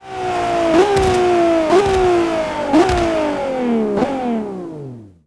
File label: throttle off, vehicles